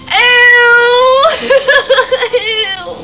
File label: eeuuu